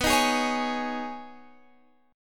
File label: B 6th Add 9th B, guitar chord